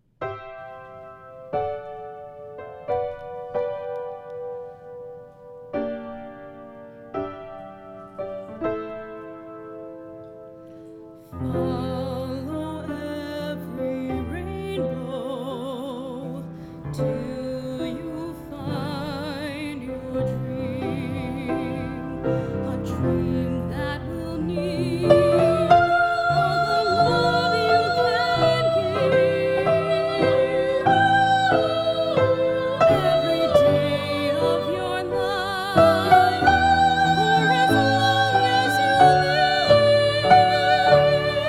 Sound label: finale sop, choir